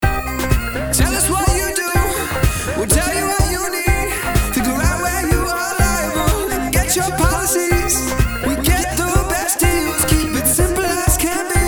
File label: theme rough mix; founder shield